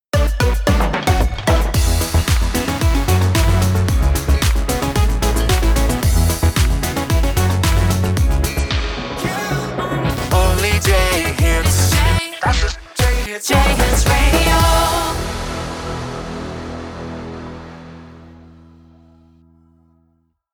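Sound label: Sung Jingles Radio, 112bpm E major, RAMP; JayHits Radio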